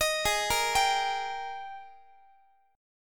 Ab Major 7th Suspended 2nd Ab down Guitar; AbM7sus2 arpeggiated; x,x,18,18,14,18

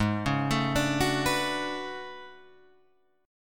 A Major 13th, guitar

